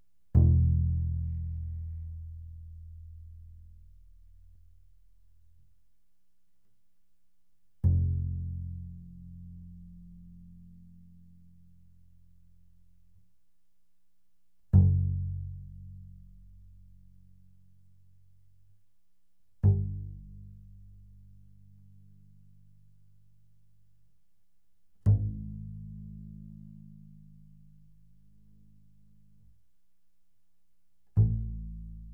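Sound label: Bass pizzicato fortissimo sul E E1 B1, doublebass; Strings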